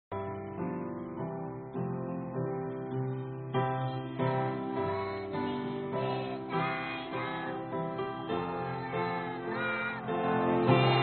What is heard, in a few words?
Kiddie Choir; Special Singing at EBC; Jesus Loves Me